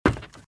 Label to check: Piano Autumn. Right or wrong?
Wrong